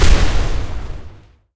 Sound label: explode mini; TankDefense